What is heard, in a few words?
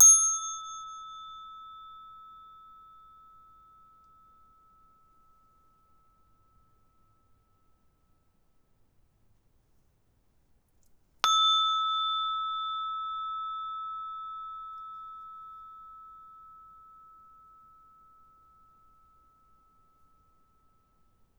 Inkin; Klangerzeuger für den Ritualgesang des japanischen Buddhismus; a) Metallstab, b) Schlägel; Instrumentensammlung